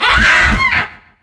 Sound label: harpy hit; DAoC